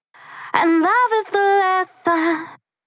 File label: chorus acapella dry raw